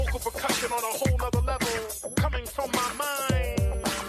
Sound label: vocal percussion